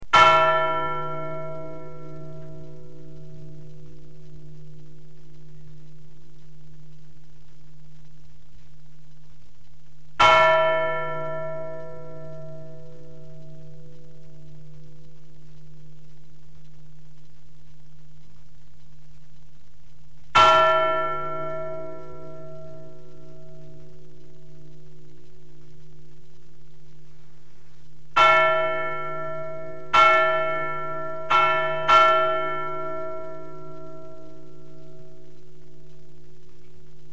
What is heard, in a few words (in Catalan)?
campana